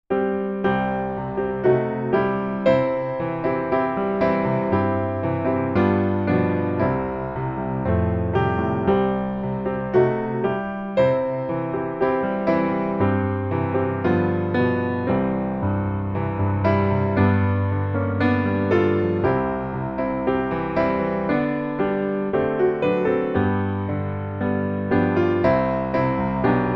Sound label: piano